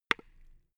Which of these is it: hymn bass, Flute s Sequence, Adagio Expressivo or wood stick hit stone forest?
wood stick hit stone forest